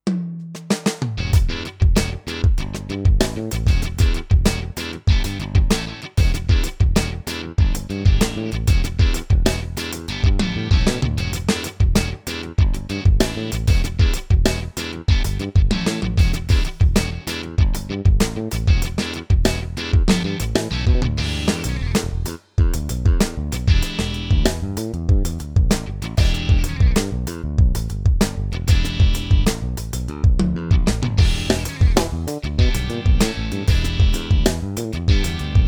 Fusion Set mit Playback

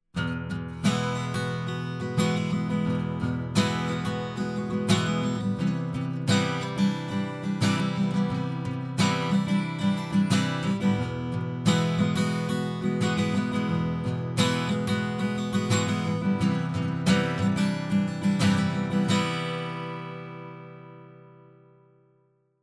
WA 47jr Git Niere

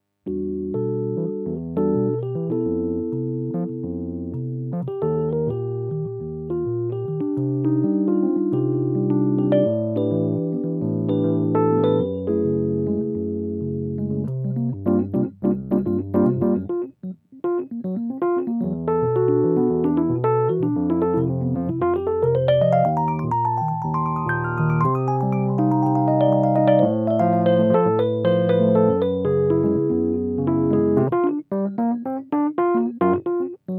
Rhodes ohne Hall

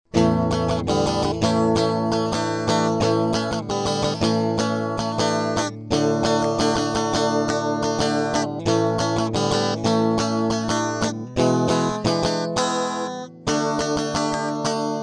Gitarre an MiniFuse 2 Hi Z; Arturia MiniFuse Recording Pack

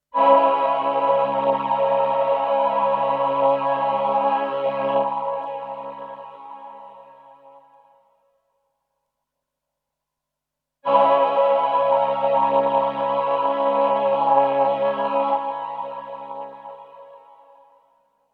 VP330 VC340 Chor langes Release

